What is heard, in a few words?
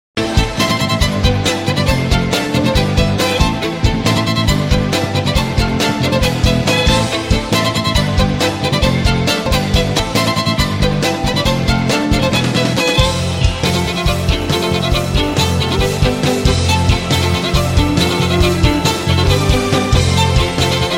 violin